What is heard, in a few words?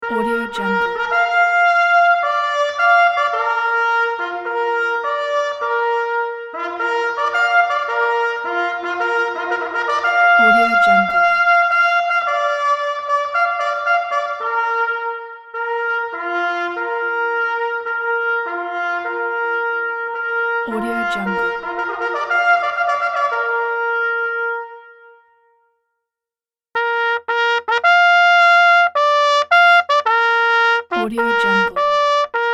retreat bugle call; isakukageyama